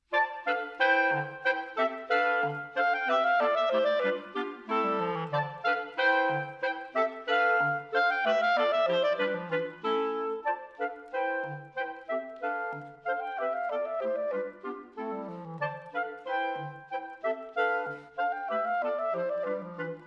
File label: Gavotte